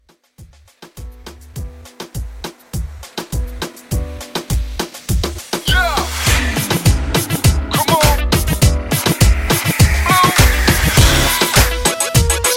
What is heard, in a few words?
Pop Redrum 102 Bpm; Rton